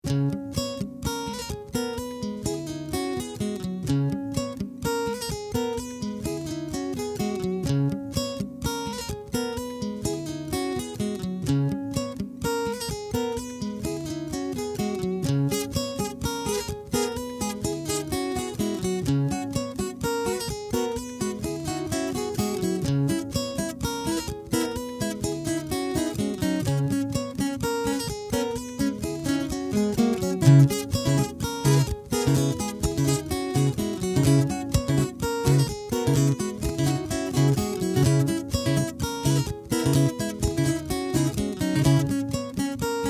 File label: permute 16th